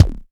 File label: BD, Bassdrums, Roland TD-20